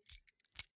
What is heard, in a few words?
key ring small place soft